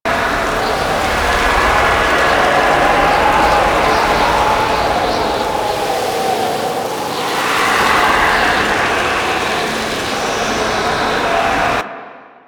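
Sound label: Big Swirling Sand Orb; Ambience; Props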